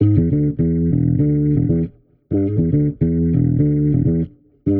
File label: 100 E, Warped Bass; Loops; Papa Bag; Touch Loops; CosmicFunk